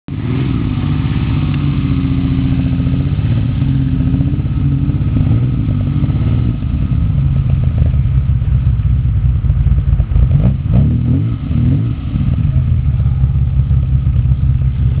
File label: Ferrari 250 GT SWB Competizione Sound